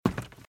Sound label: Footsteps - Wood - Creaky - Run - Left